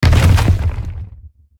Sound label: Rock Throw Impact; Evolve; Meteor Goliath